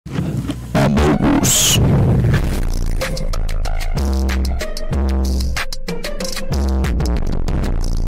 sus rap; amougus

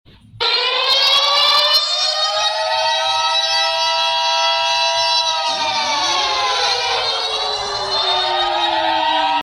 iraq eas warning sound

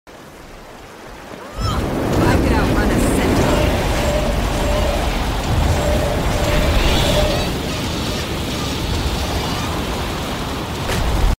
overload fire incinerator

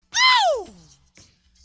awwww

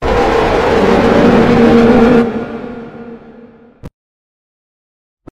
screamer

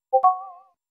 new ping sound; discord

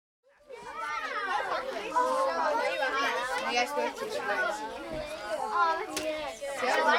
crowd

